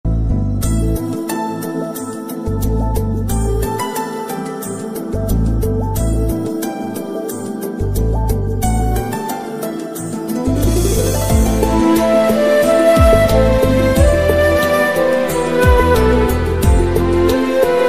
christian ring tones phone ring tone soothing ringtone christian music; jesus ring tones